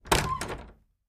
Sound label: Attic Closet Door Clo